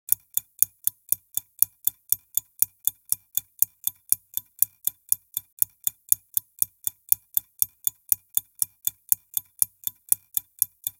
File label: CLOCK Tick Chronometre